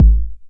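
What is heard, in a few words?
909 BD tape, kick drum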